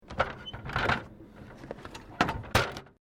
Vending Machine Drink Collected, Japanese vending machine; Machinery (Sound Effects:Machines)